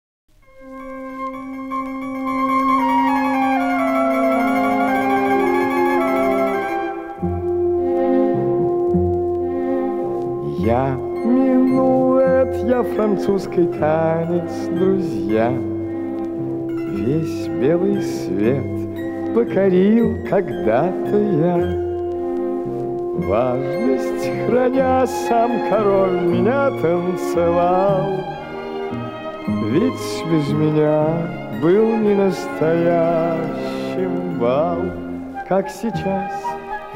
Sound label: menuet